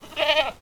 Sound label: goat call; Golden Crayon Sounds